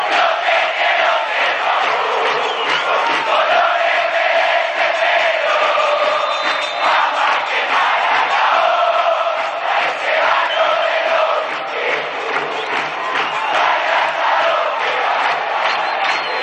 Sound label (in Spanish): yo te quiero cerro a vos, chants